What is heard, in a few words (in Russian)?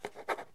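легкомысленное написание короткого слова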